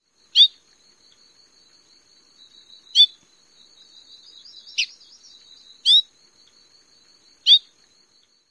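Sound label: veek and other calls, bird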